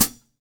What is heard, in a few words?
Hi Hats, Roland BK-5